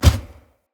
Heavy Grunt Boot Walk Default, Footsteps; Enemy; Sinecure

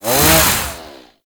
Melee Swing, Attacks; Warframe; Grineer Ghouls; Ghoul Sawman

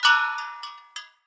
Bell Swing Short; Enemy; Corrupted Sproutfolk; Foley

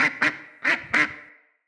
Trapped Duck Call; Encounters; Animal Rescue

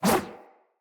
Sword Whoosh; Weapons; Player; Swords; Garren Sword

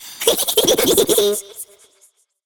Girl Idle C; Warframe; Zariman; Skitter Girl

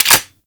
Grenade launcher Mech; Warframe; Weapons; Corpus

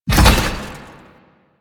Motorcycle Collision Fast; Vehicles; Warframe; Motorcycle Ninety Nine

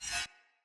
Dagger Whoosh Accent; Weapons; Swords

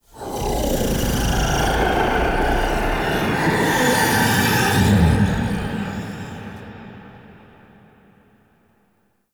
Whispers Large; Ambience; Mitw; Warframe; Entrati

